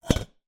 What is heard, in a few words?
Short, vase